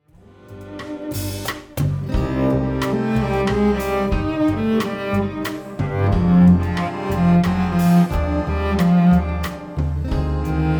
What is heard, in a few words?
Callejones de Buenos Aires Cello